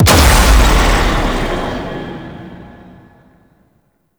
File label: Ally Death Start; Warframe; Tenno